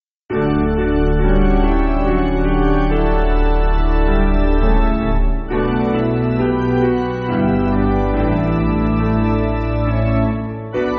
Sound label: Wordless, Piano